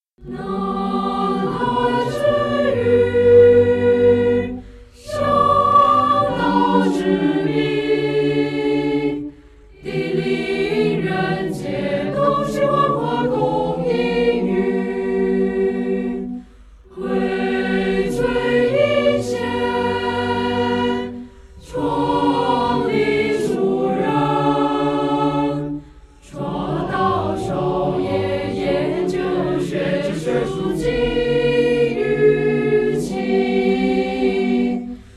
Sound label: song